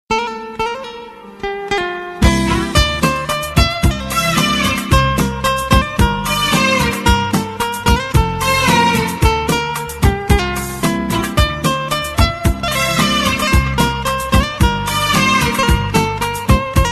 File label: Romantic Guitar Ringtone